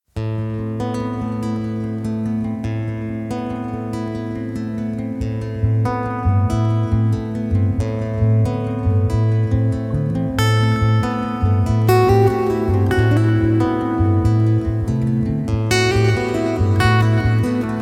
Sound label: compressor electro acoustic guitar